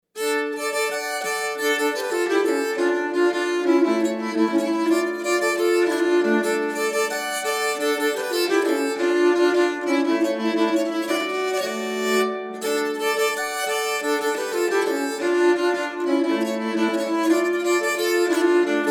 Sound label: Polska, nyckelharpa; Polska efter Nilsson